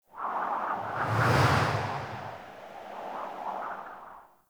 Medium Wind Gust; Wind Gusts

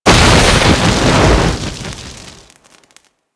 Flame Burst Short; Explosions; Warframe

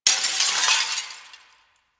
desktop glass destroy; ambience; Warframe; Entrati

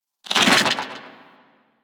Rifle Charged Tail; Warframe; Infested; Quanta

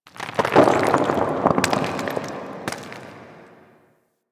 Moon Dust Fall Medium, Ambience; Warframe; Orokin

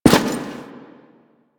Step Default; Warframe; Quests; The New War; Veso

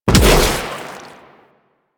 Inf Arm Cannon Shot C; Warframe; Weapons; Infested

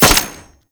Nail Grenade Shot; Warframe; Trapper

